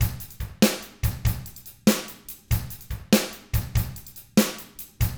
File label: rock 6/8, drums; ILIO; Double Platinum Drums 1